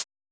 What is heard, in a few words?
90s hiphop hihat